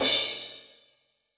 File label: Crash, Cymbal; Yamaha DTXpress-II